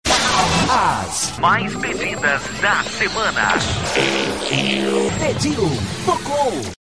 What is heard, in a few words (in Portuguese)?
vinheta